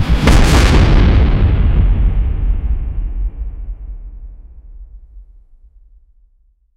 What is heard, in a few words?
a thunderous double boom; authoritative